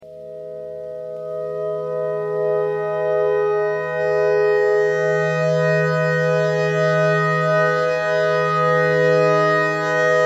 clarinet notes e clarinet notes e thread pentatonic